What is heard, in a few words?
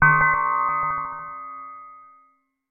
impact metal big